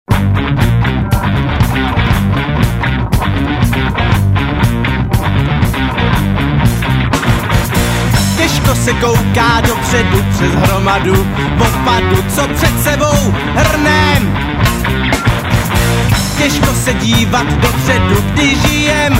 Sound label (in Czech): industrialni